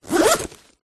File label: luggage camera bag zipper; zapsplat